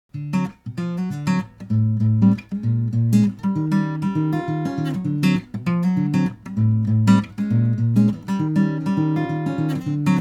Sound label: Acoustic Picking Dual99v500